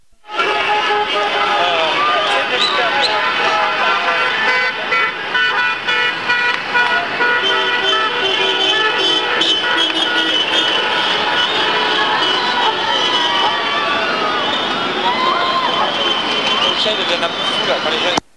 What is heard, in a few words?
klaxon; à Santiago; Chili